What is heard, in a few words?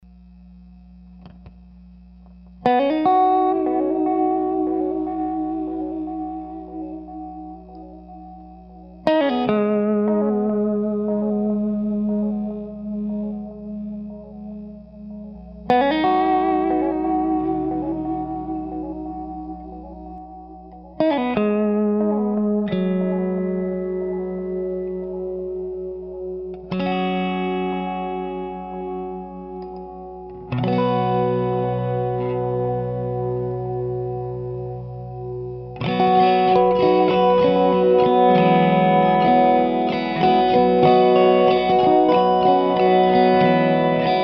rain; moody piece